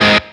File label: G#m staccato; osu